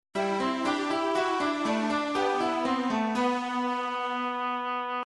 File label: item discovery; nintendo metroid